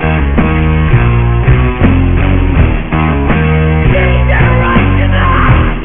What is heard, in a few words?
vocal